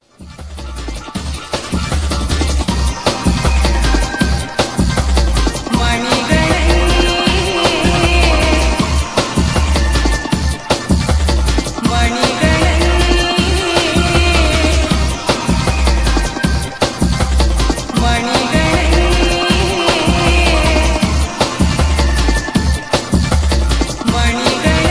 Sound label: Drum n Dub